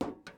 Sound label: metal step